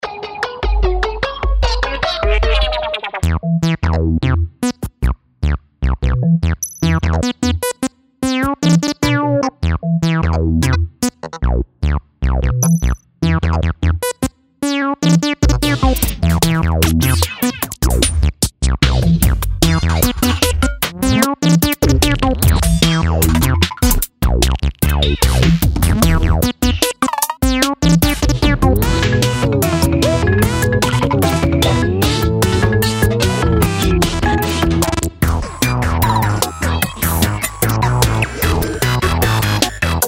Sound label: ANALOGIC ENDING TUNE KID